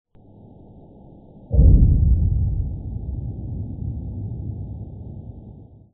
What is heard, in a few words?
Oil And Gas; cetsound